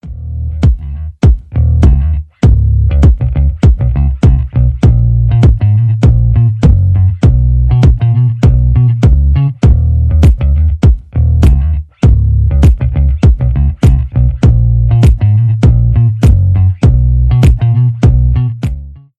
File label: iphone 8 attention ringtone; iphone ringtones